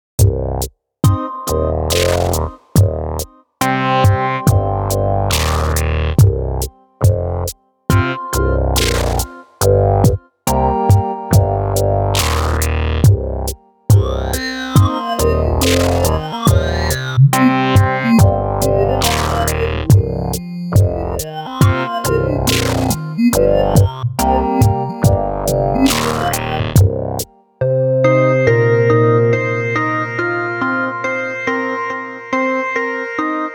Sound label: Downtempo, synthesizer; daHornet-bis; synthesizer plugin